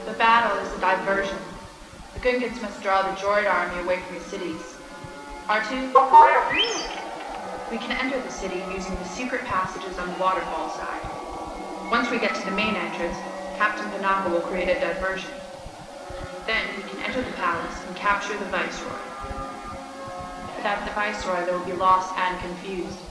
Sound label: battle long; amidala